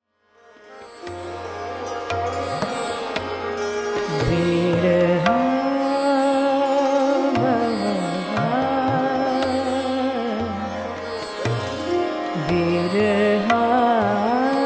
Bhimpalas Vilambit; K Amonkar

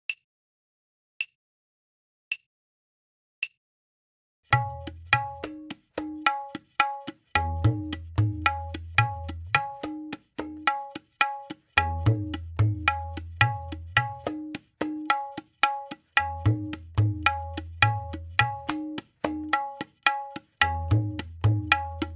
54BPM Click

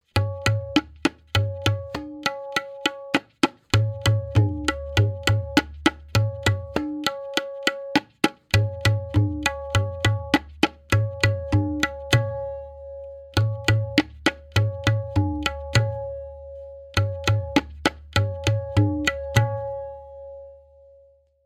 Tihai 100; Tintal; Benares Kayda 1; tabla

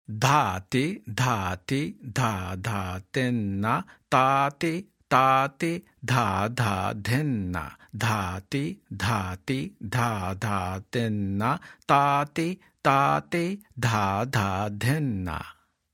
dilli kayda; Tintal; Tintal – Dilli Beginner Kayda 1 Main Bol (Theme)

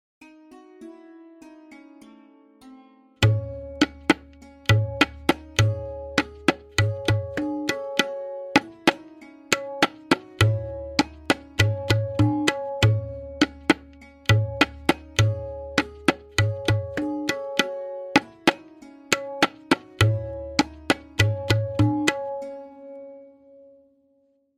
Variation 11-Played with Lehra, tabla; Tintal; Benares Kaida 1; Benares Kayda 1

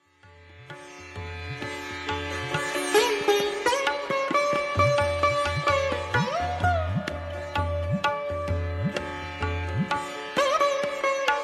Sohini Madhya; N Banerjee

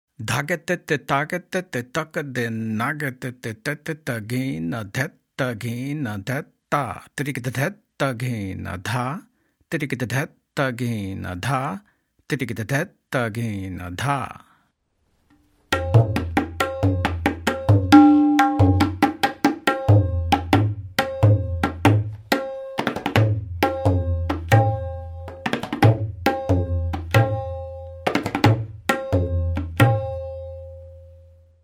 Spoken Played, tabla

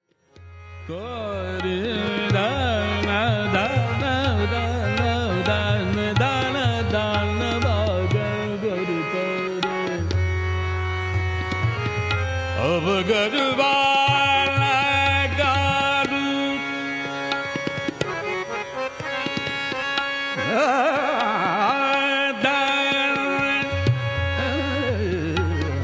Raga Patdeep Vil Tintal

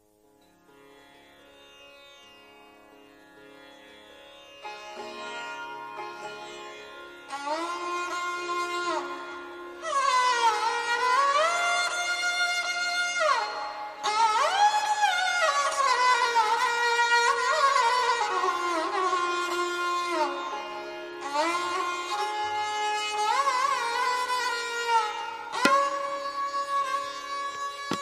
Tabla Sarangi Lahra

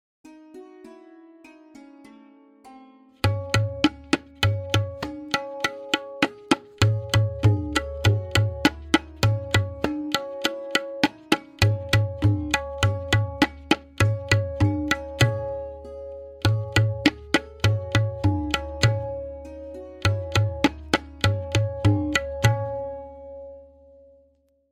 Tihai, tabla; Tintal; Benares Kayda 1

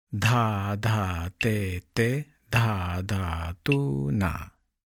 Dhadha Tete Dhadha Tuna, tabla

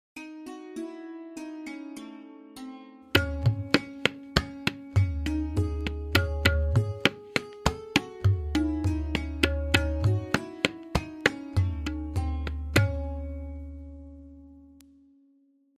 Tihai Lehra; Bedam

